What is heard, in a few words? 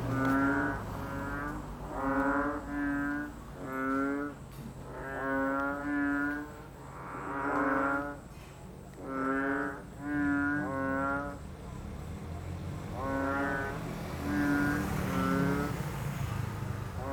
10PM in the heart of old Chiang Mai Frog Choir